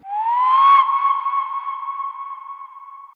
woooai; ooh ahh; ho hoo woo

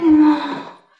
"oh" female sigh; ooh ahh

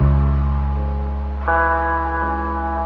guitar, Sad

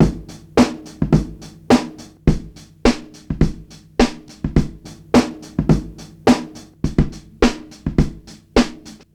Drum loops, Boombap

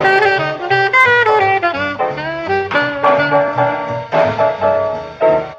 sax and piano jazz thang, loops, phonky

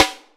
snare, electro; Snares

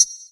synth, bells; Misc Percussion

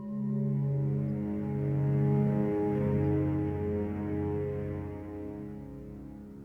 strings; Sad

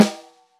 belchy, snares; Real

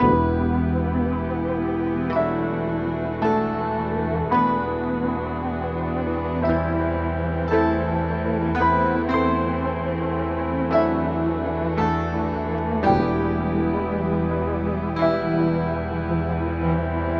strings, Sad Loops

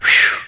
"whew"; Voice; ooh ahh; human

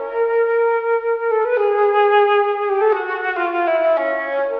flute, Loops, Sad

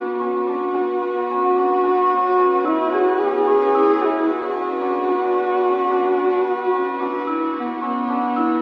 keys, sad loops

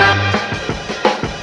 dirty mind with stab, funky drum loops

